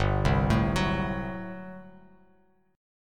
G Major 7th Suspended 2nd Suspended 4th G down Bass Standard; GM7sus2sus4 arpeggiated